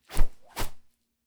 sling attack

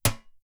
arrow metal, attack impact

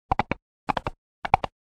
step, mounted movement

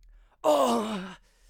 male death

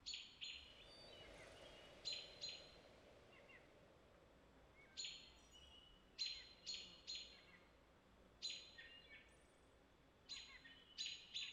day temperate; ambient dayscape

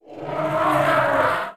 elephant order; fauna

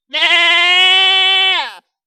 goat, death; fauna

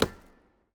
lumber tree

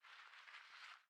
hoe, farming

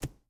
arrow dirt, attack impact

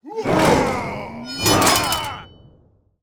Special War Building, Complete; 0AD; building Completion

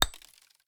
sling stone, attack impact

